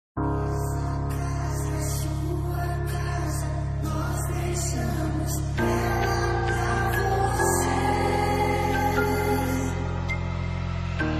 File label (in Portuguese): remix eletronica gospel